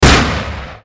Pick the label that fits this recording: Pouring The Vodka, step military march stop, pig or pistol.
pistol